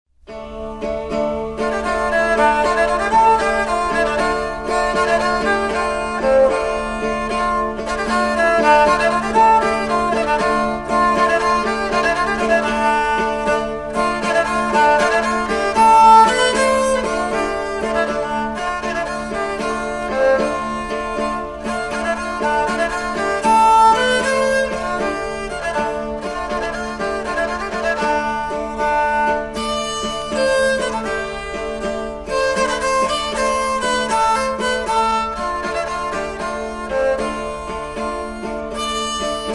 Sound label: estampie